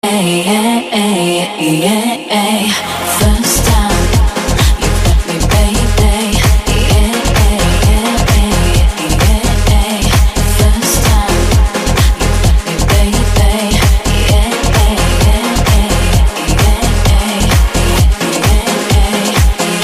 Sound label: Runway Rock